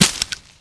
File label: bush; Mafie 1